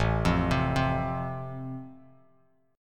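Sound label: A Minor Sharp 5th, Bass Standard, chord strum; Am#5 arpeggiated; 5,8,10,5; JGuitar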